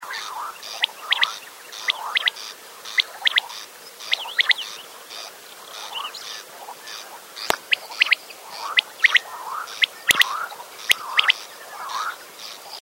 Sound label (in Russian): perepel korostel